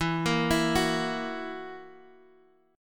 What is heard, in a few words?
E Suspended 2nd Flat 5th E, Guitar, Open F, Esus2b5 chord; arpeggiated; x,11,10,11,9,x